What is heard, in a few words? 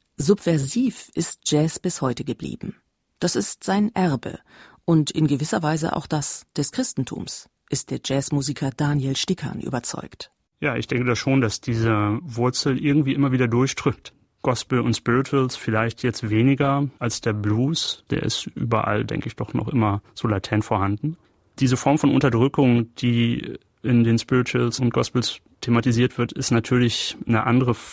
Jazz; Bayern2; Jazz und Kirche